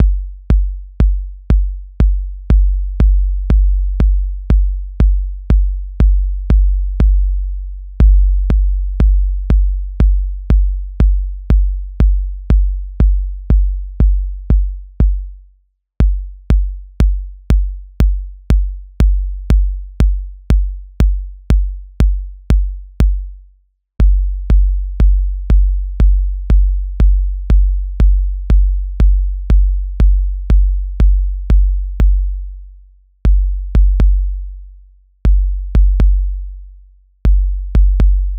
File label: Roland TR 08 Kick